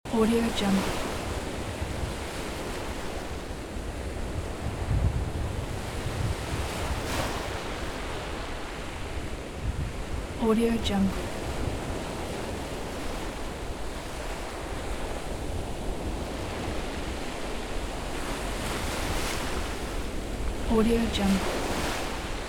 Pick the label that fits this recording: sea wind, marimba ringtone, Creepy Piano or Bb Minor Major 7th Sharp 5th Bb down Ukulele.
sea wind